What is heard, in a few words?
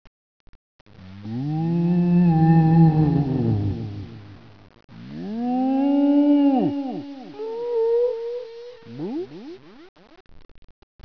moo; hall of moos